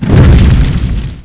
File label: BURN; WEAPONS